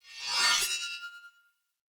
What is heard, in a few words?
Short Sword Whoosh Accent Heavy; Weapons; Player; Swords; Sollos